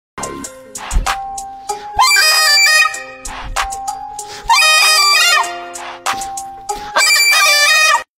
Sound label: Hip Hop